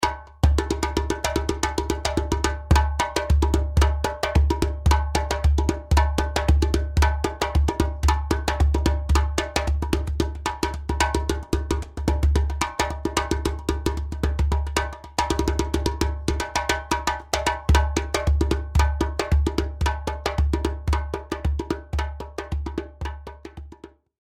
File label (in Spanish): djembe mediano de estudio